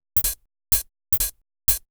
HOUSE BEATS 125

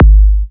Minimal Kick, Drums, Kicks

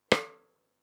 Snares & Claps, One Shots

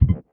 One Shot, Hits

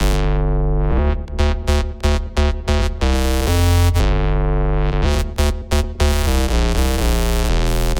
120 Gm Square Groove, Analogue Bass; Loops; Touch Loops; CosmicFunk

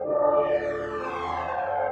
SYNTH PAD ETHNO 125 A, Sphere

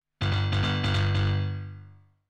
PIANO 96 G; OLDSCHOOL; Keys

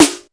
LIL SNARE; drum; SNAREDRUMS

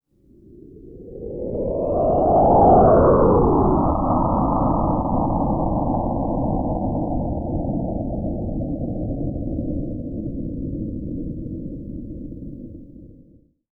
SPACE SHIPS; SpaceSounds

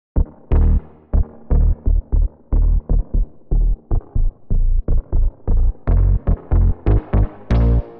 120 F# Clicker, Bass Loops; Touch Loops; BlissedOutBeats

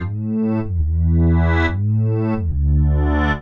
SNTH BASS TEKNO 140 A

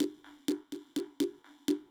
PERCUSSN ETHNO 125, Drum

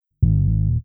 TECHNO BASS 140 A

